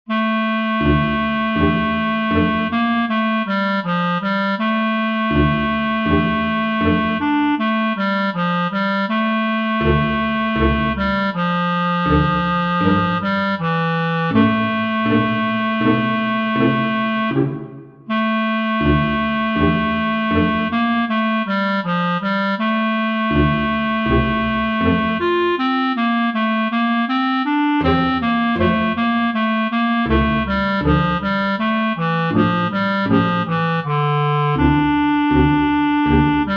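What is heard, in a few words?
preludes for clarinet strings; franck cesar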